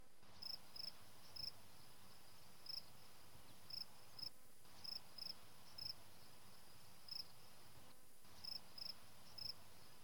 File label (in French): cigales